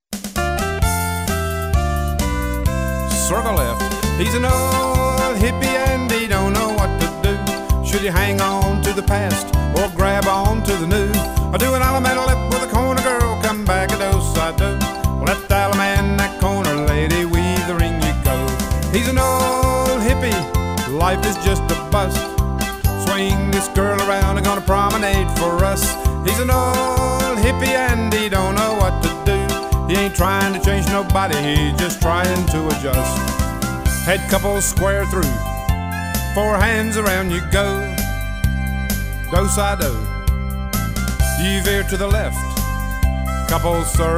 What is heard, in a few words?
old hippie vocal, square dance